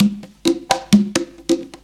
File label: conga, Deep House, 130bpm